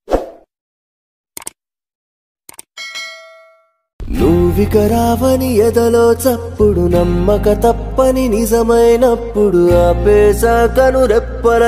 male ringtone

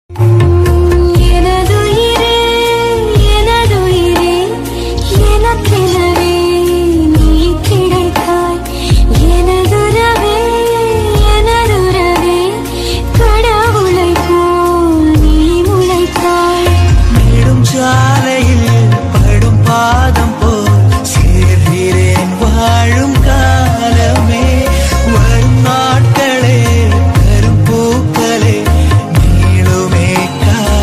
tamil love bgm ringtone

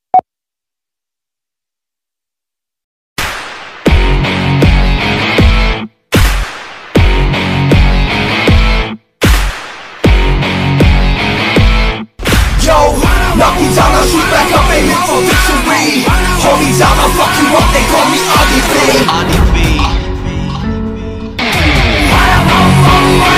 aditya varma bgm

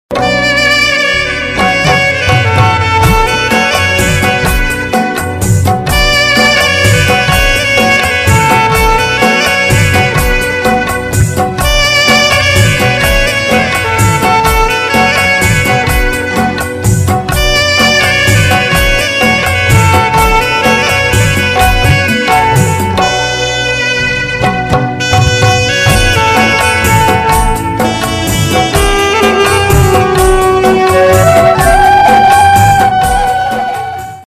saxophone ringtone hindi ringtone; maa ringtone

